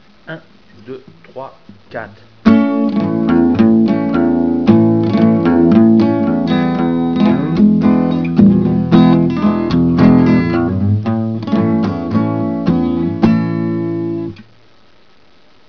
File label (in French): HABANERA, guitare